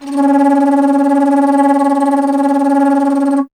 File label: Alto Flute; Miroslav Vitous; Solo Instruments